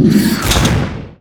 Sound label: power break